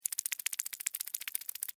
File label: Insect Run Wet, Insect, Run, Wet, Slimy, Bug; Animals:Insects; Insect Movement; Blastwave